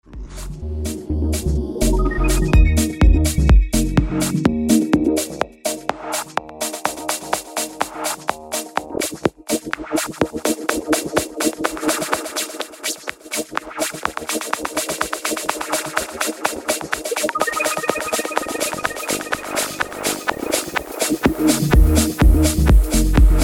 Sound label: HPF Echo